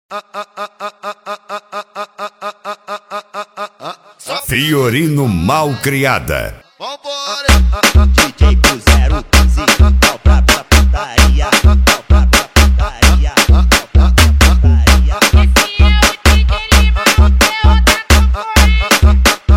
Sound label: mega funk bass